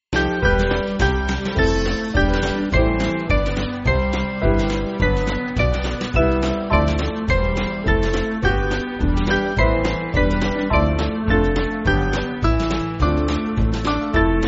Band, church music; O Jesu Christ; Gelobet Seist Du

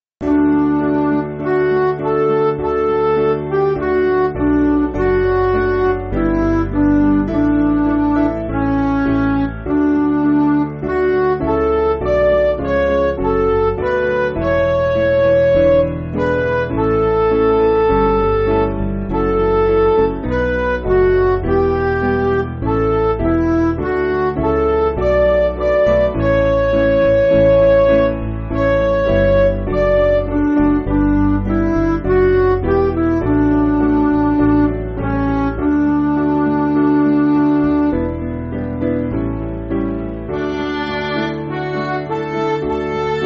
Midi

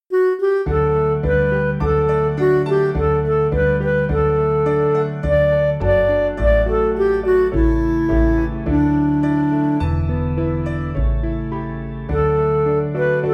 Midi

